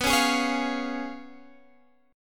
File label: Db 7th Cb; Db7/Cb; strummed